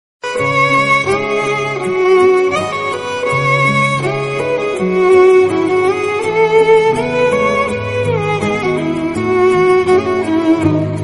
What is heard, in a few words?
Sad Instrumental Tone